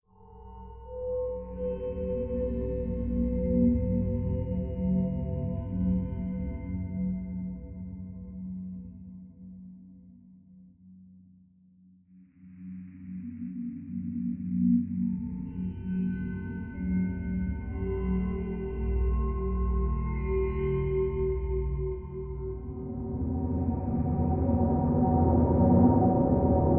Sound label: Ambiance; No More Room In Hell; Windows